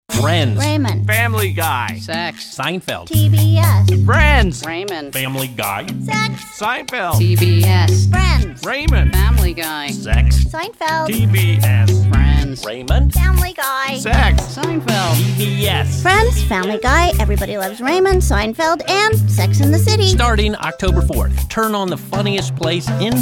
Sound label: comedy cha cha